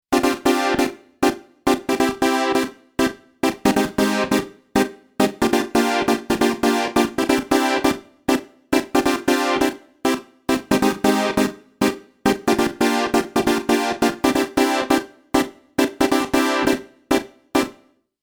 poly synth

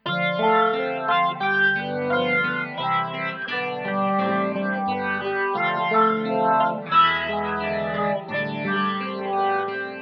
organ tone; gt-001

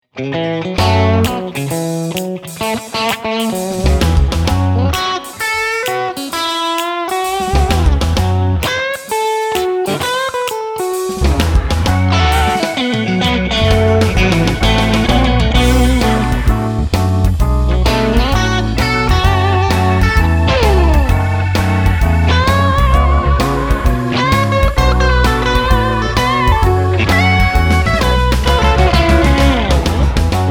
blues lead

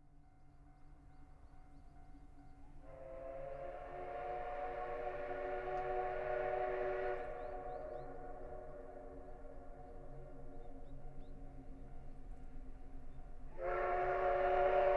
approaching train

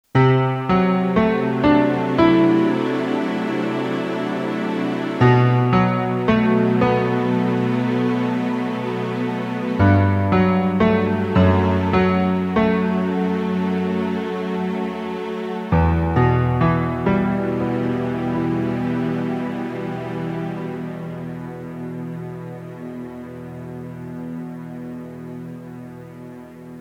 piano and strings

